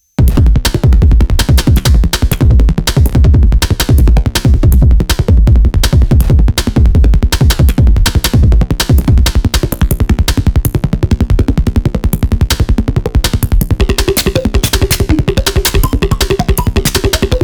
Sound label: Beat; Five12